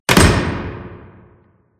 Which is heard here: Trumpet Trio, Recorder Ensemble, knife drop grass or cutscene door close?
cutscene door close